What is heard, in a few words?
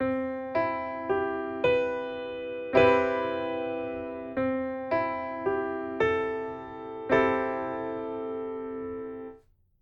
Cmaj7 C6